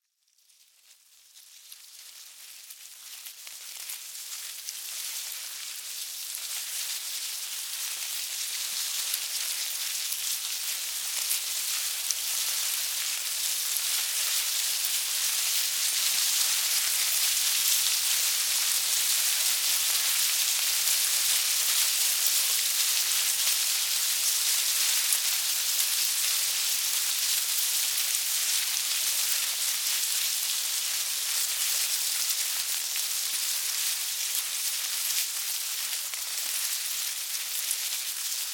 Insect Ant Army Movement Herd Approach Leave Colony Bugs; Ants; SFX Bible